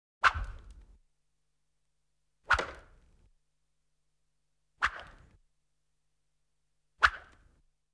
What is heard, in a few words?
Horror, Whiplashes; BBC Sound Effects Library